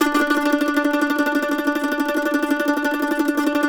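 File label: mandolin tremolo D4; Sampleheads; Whole Lotta Country 1 & 2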